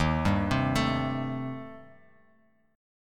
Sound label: D sharp 6th Flat 5th, D sharp, down, Bass Standard; arpeggiated; JGuitar